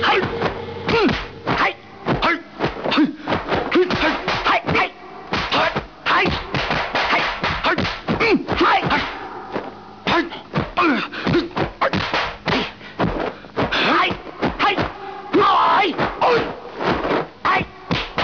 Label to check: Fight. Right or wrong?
Right